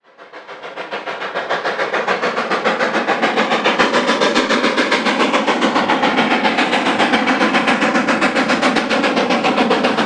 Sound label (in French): locomotives a vapeur; sonotheque